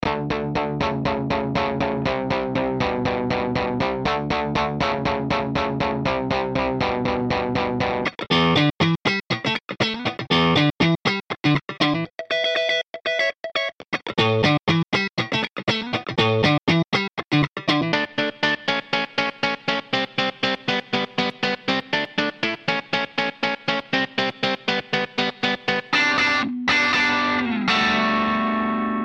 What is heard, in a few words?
Funky Tone